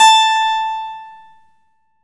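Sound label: harpsichord; Roland - LA Composer vol. 1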